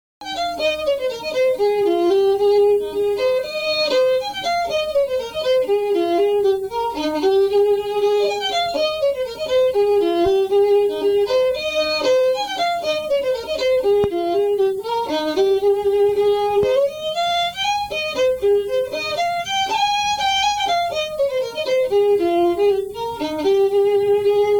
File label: fiddle; girl i left behind